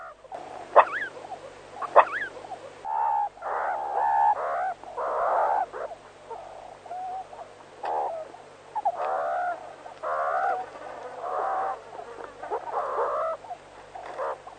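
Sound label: surikat staia, meerkat pack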